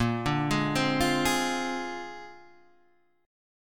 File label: A sharp 9th A sharp, Guitar Open D